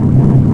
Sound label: whale, ships engine; Quantum Redshift; XBOX; kaitlyn